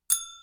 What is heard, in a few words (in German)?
pling; Digitaluhr